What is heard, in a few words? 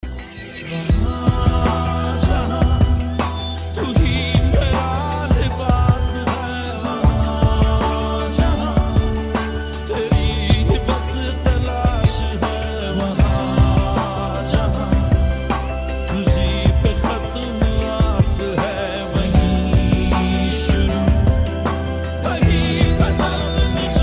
Hindi Romantic Ringtone